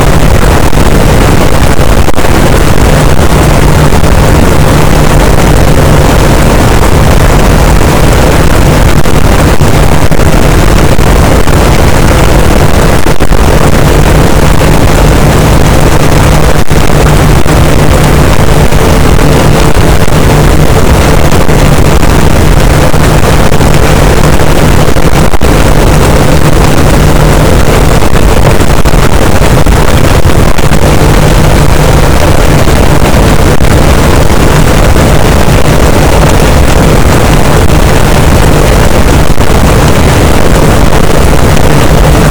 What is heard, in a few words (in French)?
cachalot positif, boat, Physeter Macrocephalus